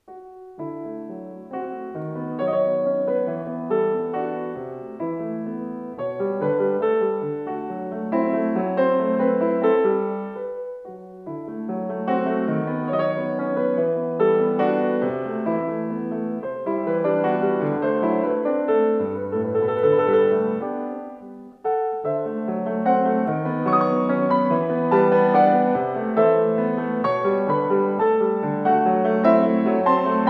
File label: waltz